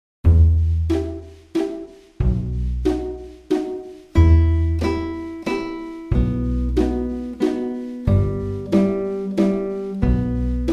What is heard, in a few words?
Waltz